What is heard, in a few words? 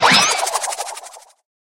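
cartoon cactus attack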